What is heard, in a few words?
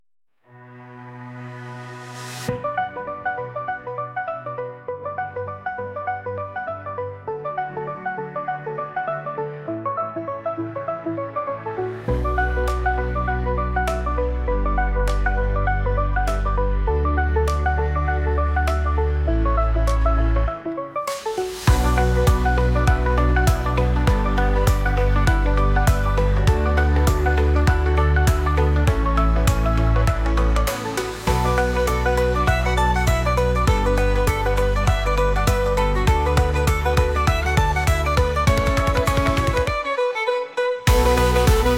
Sweet Irish Song; musica instrumental